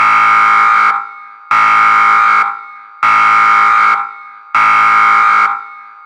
Alarm, Evacuation, Malfunction, Large, Danger, Nuclear, Control Room; Blind Drive